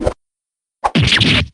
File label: stab